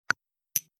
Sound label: tick